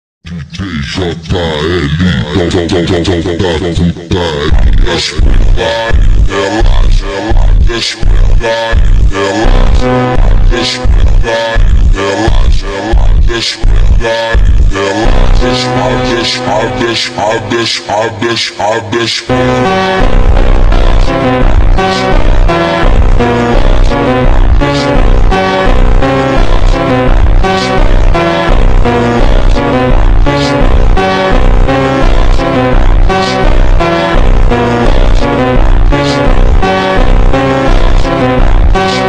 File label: super slowed reverb